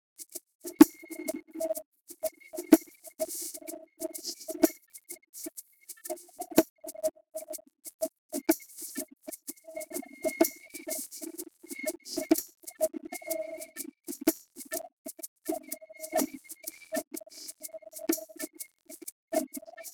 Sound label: percussive